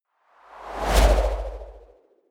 whoosh impact soft wobble bass high

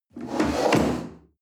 chair wood slide on floor hard bump

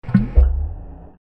liquid event

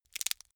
crack knuckles

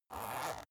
zipper use slow short

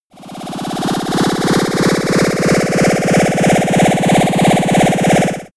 sci-fi approaching ufo

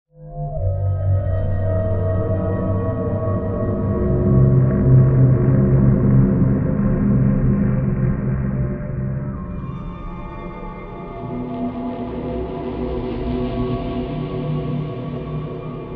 sinister drone

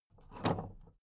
big plastic case handle take

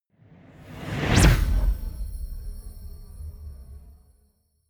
whoosh shimmering zap

